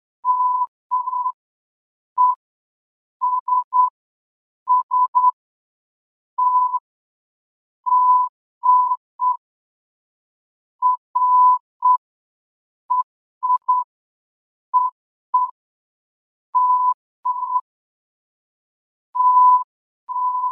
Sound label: 1000Hz